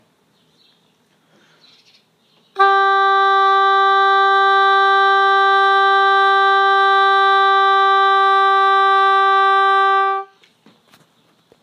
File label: Long Tone Legere; Laubin